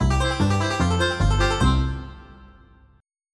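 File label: bluegrass; Wired for Sound Pro CD; Aristosoft, Inc.; music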